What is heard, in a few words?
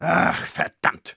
Engineer negativevocalization, de